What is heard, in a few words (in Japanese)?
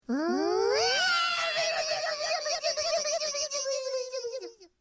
手を洗う